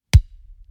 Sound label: ball block; Westview Academy